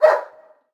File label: Dog Bark; City Amb